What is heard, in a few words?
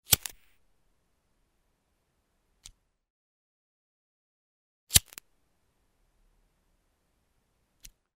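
flint lighter sound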